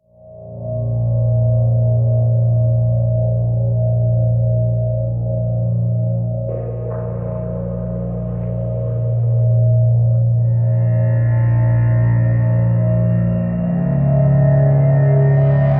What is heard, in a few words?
Creepy Ambience